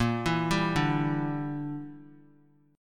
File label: A sharp 6th Flat 5th; A#6b5 arpeggiated; JGuitar